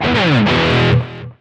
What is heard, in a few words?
Pickup Guitar Riff; SFX Kit; Pickups